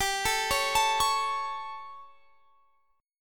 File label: G Suspended 2nd Flat 5th G Guitar Standard; Gsus2b5 arpeggiated; JGuitar